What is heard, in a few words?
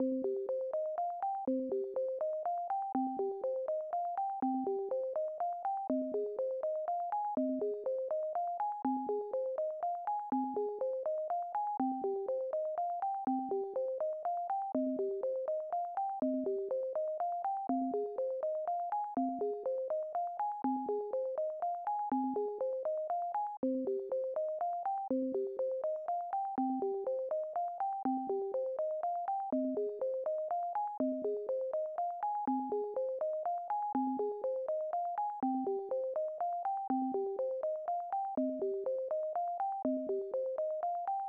Failure; Fire Striker